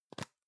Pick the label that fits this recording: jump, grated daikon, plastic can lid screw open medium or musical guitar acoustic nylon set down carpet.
plastic can lid screw open medium